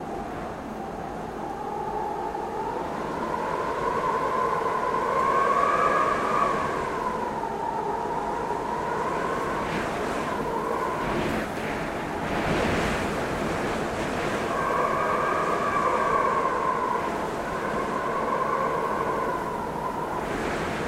VIENTO SOPLANDO MUY FUERTE HORA PICO EN CERROS DE PARACAS; aire

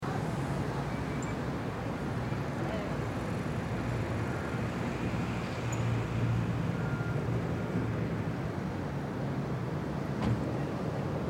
ALARMA DE AUTO ACTIVADA EN CALLE

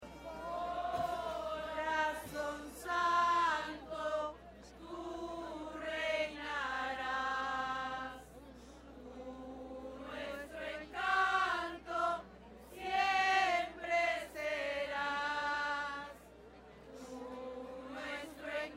SEÑOR DE LOS MILAGROS PROCESIÓN CANTADORAS

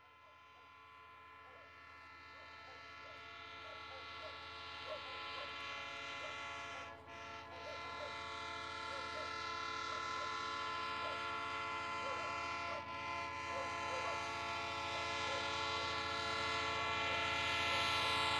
TREN PASAJE SAN JERÓNIMO VALLE DEL MANTARO; transporte

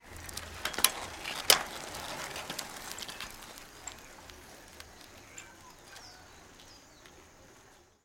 BICICLETA ANTIGUA PARTE Y SE ALEJA EN PARQUE; Plaza

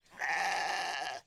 CABRA

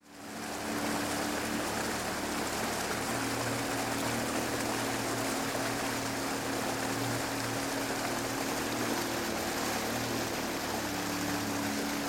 LANCHA AVANZA EN ORILLA SANTUARIO MANGLARES DE TUMBES

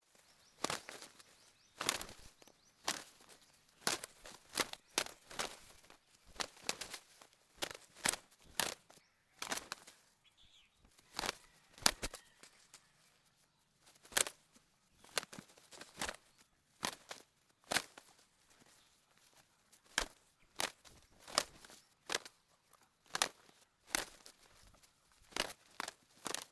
GALLOS DE PELEA ENTRENANDO ALETEOS

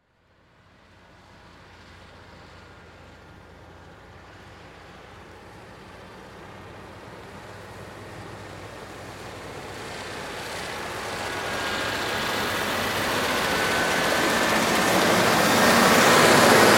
TRACTOR SE ACERCA Y PASA

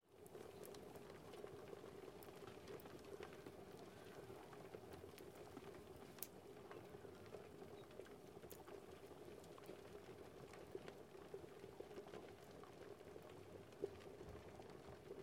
COCINA DE LEÑA PRIMER PLANO DE SOPA HIRVIENDO INTERIOR CASA COLCA

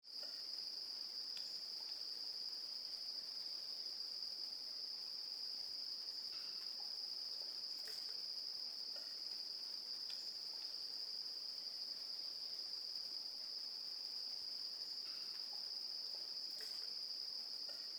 CONCHAS ABRIÉNDOSE EN SANTUARIO MANGLARES DE TUMBES; animal; Mar; olas

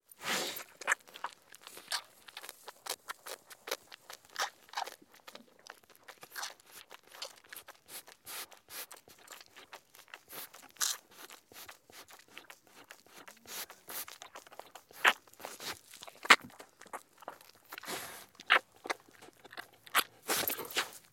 TERNERA MAMANDO PRIMER PLANO ESTABLO AREQUIPA; animal; animales; vaca

